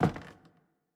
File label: Footstep Basement Wood Stairs